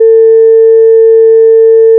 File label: fm voice single; FFTimbre